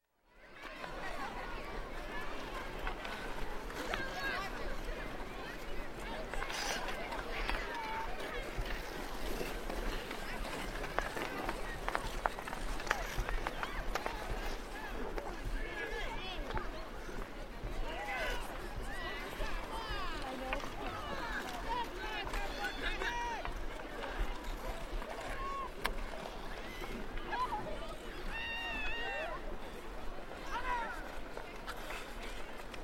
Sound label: Hockey Outdoor Ambience; Sound Ideas 2000 Series